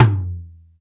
sfx perc

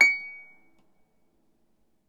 Harp C#7; Miroslav Vitous - Percussion & Harp

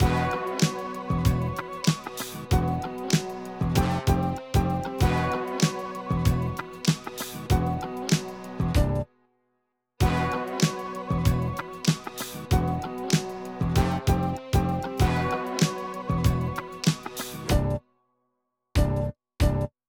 Melody

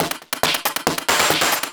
percussion loops, Techno; PS-45 WAV Techno ID

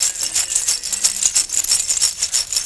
Shaker, Percussion Loops; Ambient Grooves

